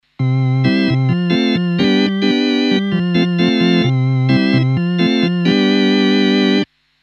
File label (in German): schweineorgel